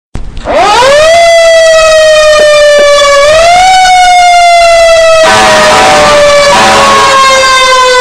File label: Firetruck